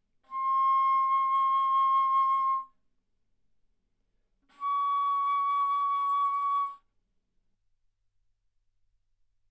Bass Flute ff C6 Db6

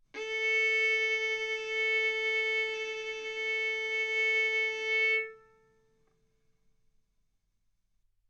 Violin arco mf sul A A4 B4 stereo

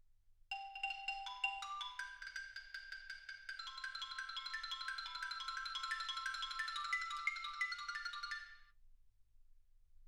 xylophone

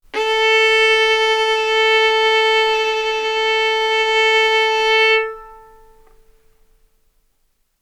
Violin arco A4 B4

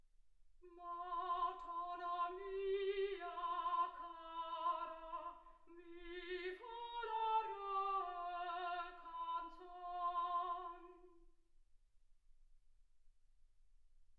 soprano

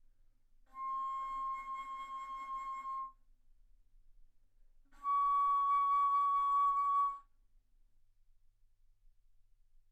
Bass Flute ff C6 Db6

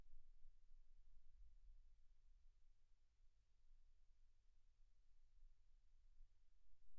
silence 48kHz

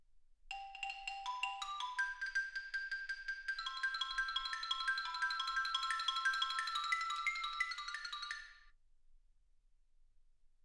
xylophone

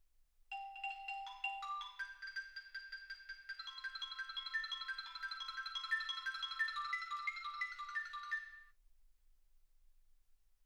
xylophone

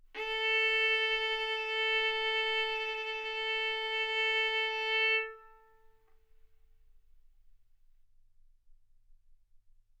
Violin arco A4 B4; mf; sul A; stereo

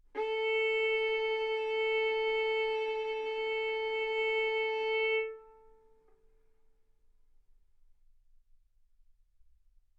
Violin arco mf sul A A4 B4 stereo

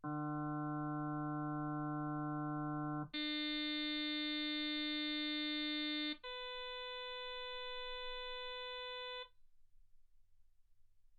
harm sinus 48kHz

